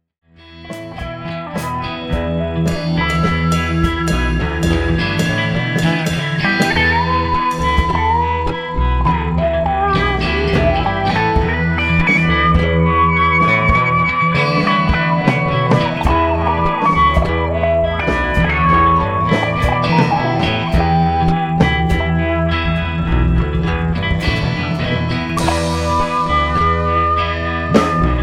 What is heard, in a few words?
bong